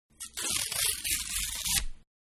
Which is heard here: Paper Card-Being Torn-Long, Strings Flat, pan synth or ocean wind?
Paper Card-Being Torn-Long